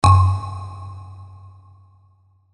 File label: ambient boing